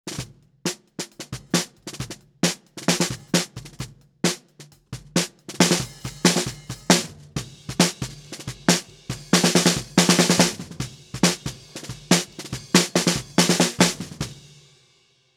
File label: Snare Shoot Snr Bottom SM57